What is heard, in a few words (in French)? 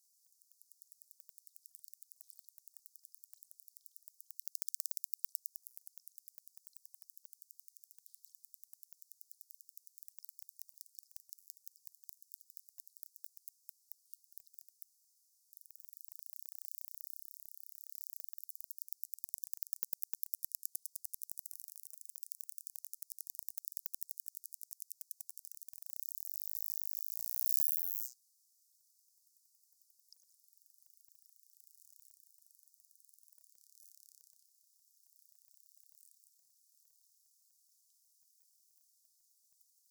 clics puissants; globi